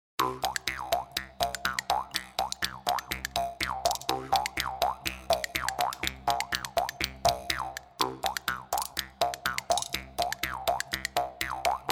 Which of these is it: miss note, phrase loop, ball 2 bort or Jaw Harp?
Jaw Harp